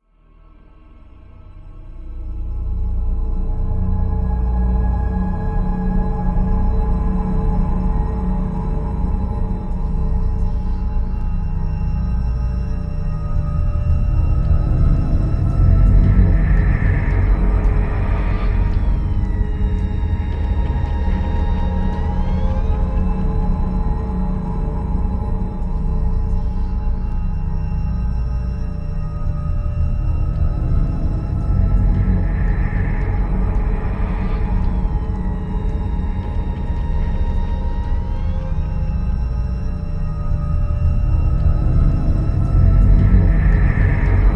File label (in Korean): Tension 공포